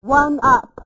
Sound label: oneup female slowed